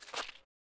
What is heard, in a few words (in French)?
petit bruit animaux pur